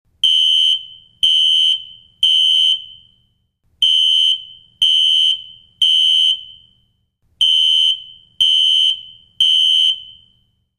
Alarm Whirring Beep; hi-tech-various

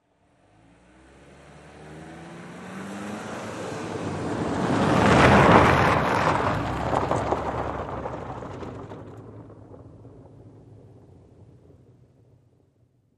Sedan Pavmt Grvl Med

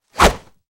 Sword Swishes Cloth H, whooshes & swishes

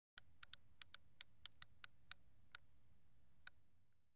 Fishing Short Click

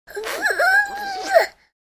Baby: Happy Blowing.

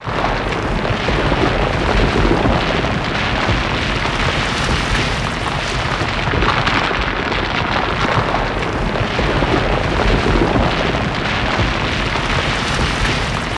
Avalanche Rock Slide

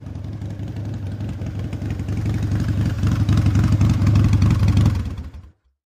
harley drive up, harley-davidson

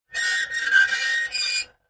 Metal Scrape On Metal

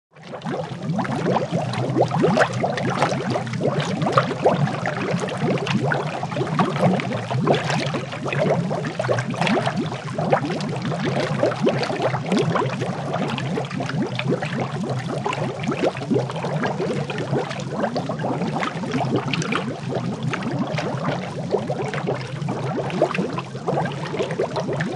Bubbles Heavy Consta

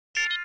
Computer Beep High P, beeps

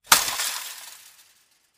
Chain Link Fence Gate; fences

